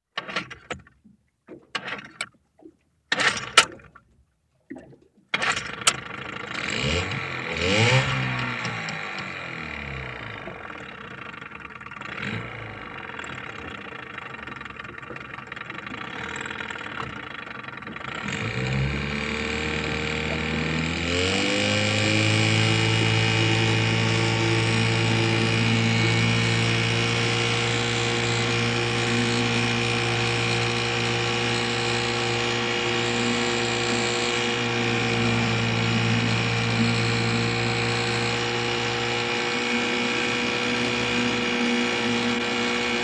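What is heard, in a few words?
motor boat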